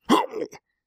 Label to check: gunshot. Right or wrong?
Wrong